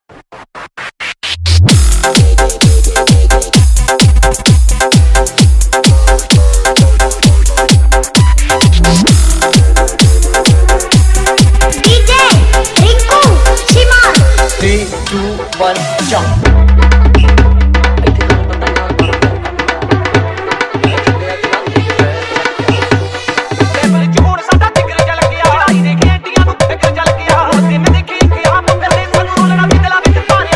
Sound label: Remix Punjabi Song